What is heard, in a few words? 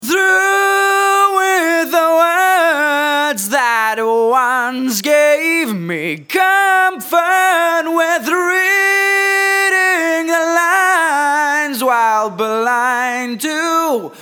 vocal reverb, Notice how dry the voice sounds first and then when the reverb kicks there is more life and excitement in the voice.